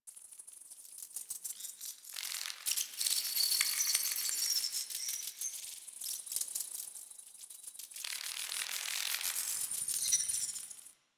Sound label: Landscape Insect Loop; Warframe; Ambience; Venus Open World; Positional Stereo Loops